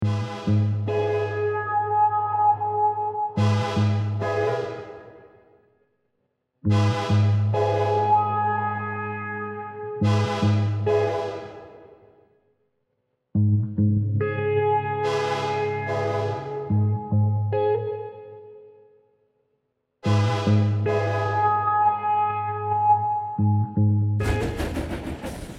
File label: slo funk train